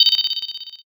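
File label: bell; suzu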